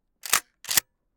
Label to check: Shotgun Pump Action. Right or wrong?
Right